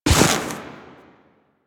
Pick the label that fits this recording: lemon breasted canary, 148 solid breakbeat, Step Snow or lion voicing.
Step Snow